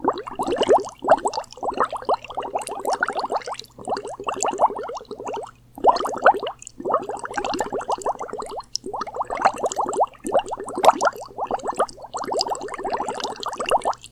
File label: bubbles; ragemap2023